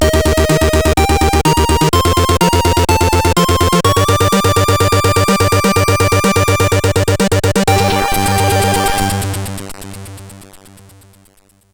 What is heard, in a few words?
sid CSG8580R5; hvsc